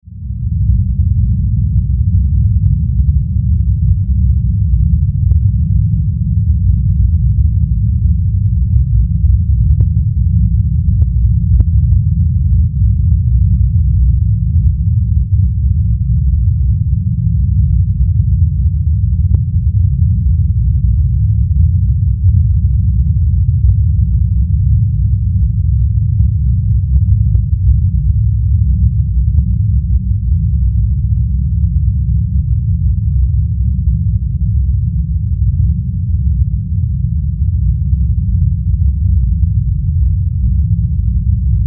Spaceship Interior, Spaceship, Interior, Rumble, Drone